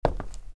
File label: tile; player; Group Mapping Project